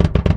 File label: Metal Doublekick Fancy; Airwindows